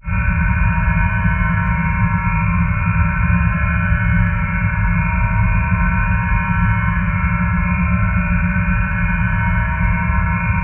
spaceships; blindside

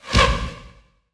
POW; XXL 800 Production Effects

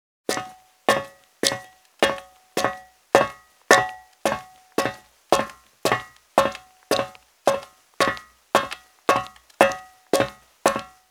Footsteps Fire Escape Slow; blindside

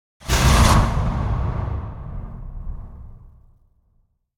Impact Whoosh Airy Medium; Hollywood Edge; sound designer's toolkit v2

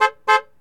Truck Dodge Ram Horn; Trucks

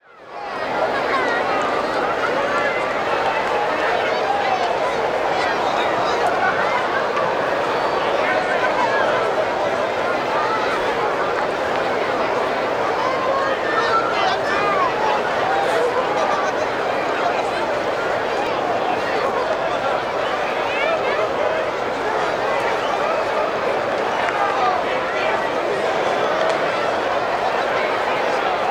Stadium Large Crowd; crowd walla; Sound Ideas; Walla Series

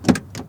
Auto Door Open; Automobiles

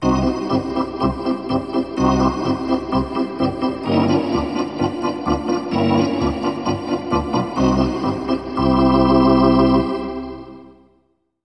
Hockey Organ

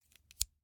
Scissors, snap, snip, shear, small med, single; Eiravaein Works; Vaeyan IV

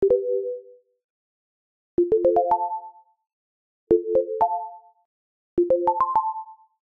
Digital Click Positive; boom library; The Interface

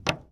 Plane P40 Step On Wing; SFX Kit; Airplanes

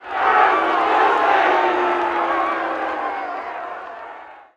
BMX Crowd Boo; Crowds

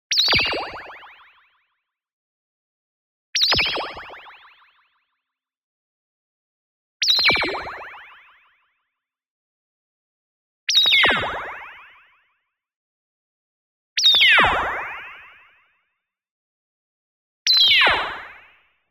TONAL Modulated Chirp; boom library; Anime Essentials

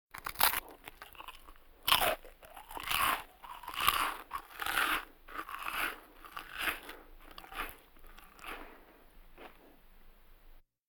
Vocal effects breathing Moaning Baby

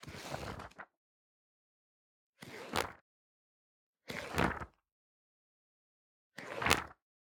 Organic Paper Brush Pages; boom library; The Interface

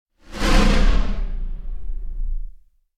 Jet Phased Whoosh Going Down; Hollywood Edge; sound designer's toolkit v2

